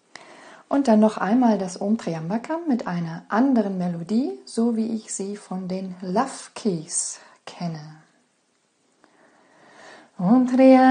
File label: Om tryambakam Melodie